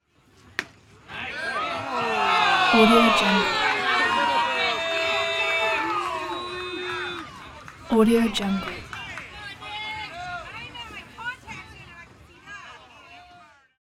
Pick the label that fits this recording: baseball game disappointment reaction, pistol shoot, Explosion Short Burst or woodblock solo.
baseball game disappointment reaction